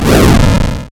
Clown Car Strong Fire; Super Mario Bros. Remastered

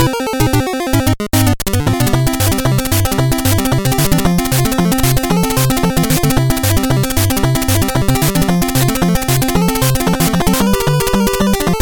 Boo Race Hurry, BGM; Super Mario Bros. Remastered

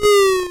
Lift Fall; Super Mario Bros. Remastered

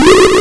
Magic; Super Mario Bros. Remastered

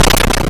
Break Block; Super Mario Bros. Remastered

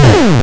Cannon Fast; Super Mario Bros. Remastered

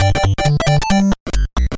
Mountain Pipe Cutscene, BGM; Super Mario Bros. Remastered

